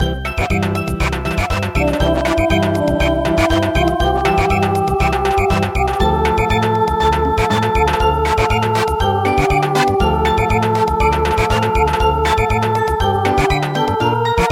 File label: Samba